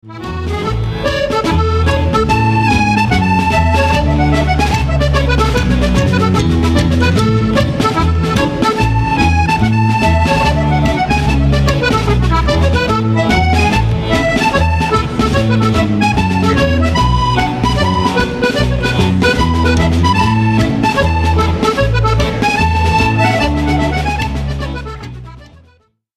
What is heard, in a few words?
Instrumental Scottish Strathspey; Laird Of Drumblair